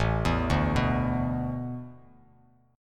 A Suspended 2nd Suspended 4th, Bass Standard, Asus2sus4 chord; arpeggiated